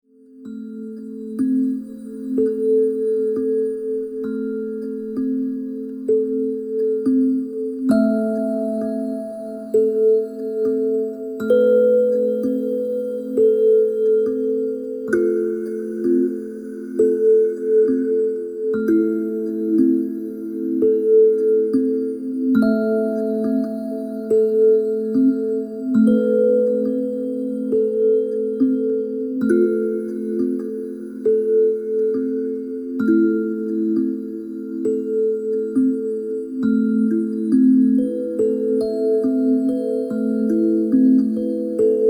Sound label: Sounds of my Hands